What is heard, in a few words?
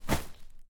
ball in goal; The Smurfs - Penalty Shout-Out; NextlevelGames